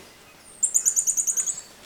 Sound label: callithrix jacchus